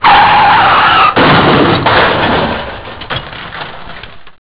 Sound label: screech crash, Vehicles